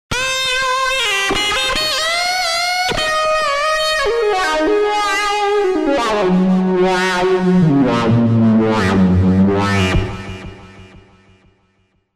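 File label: wah distortion; TURBO VL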